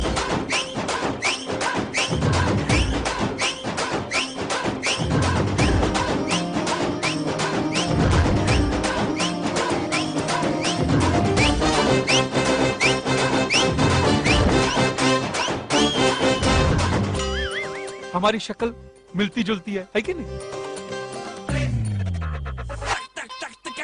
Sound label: Ready Whistle